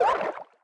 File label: Swim; SM3DW